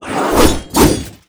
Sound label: Assassin attack; Scorched Canyon